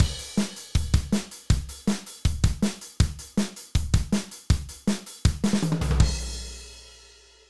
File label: kick